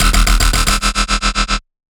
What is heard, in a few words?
Bass D# Startup, Dubstep; Bass One Shots; Ghosthack; Dubstep Sound Arsenal